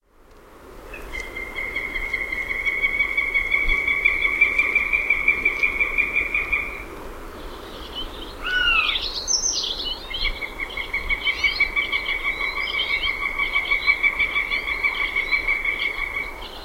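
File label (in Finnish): kanahaukka, lintuäänet; Metsät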